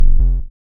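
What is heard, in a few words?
waveform FM triangle triangle envelope silence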